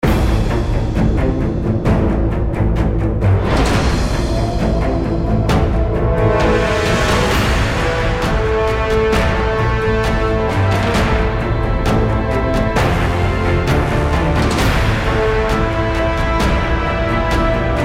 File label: Battle BGM Europe; Neoverse